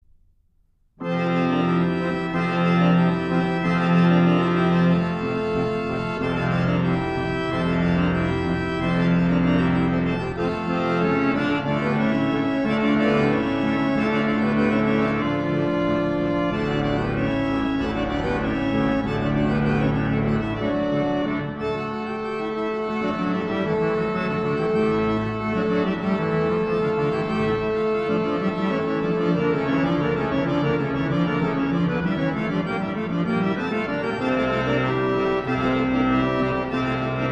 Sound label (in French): piece en fa harmonium; Franck